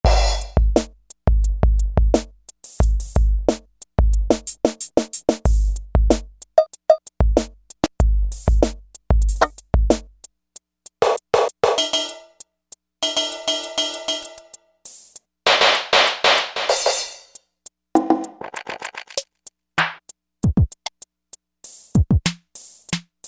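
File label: drum Electro; E-mu Orbit V2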